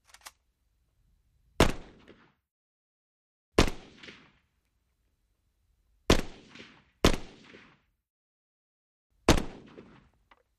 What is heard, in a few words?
AK47 Chamber Round